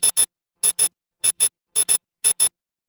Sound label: office alarm clock